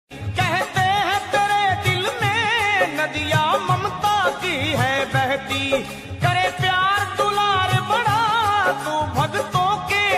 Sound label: bhakti ringtone durga mata ringtone hindi bhajan ringtone